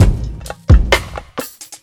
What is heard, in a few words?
Loop